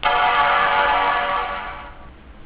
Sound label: Avvio power book 1400, Registrazione ambientale da un Powerbook 1400; Mac